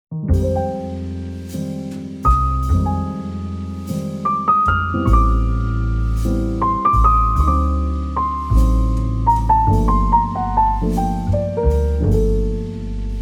jazz romance cozy fireplace relaxing podcast music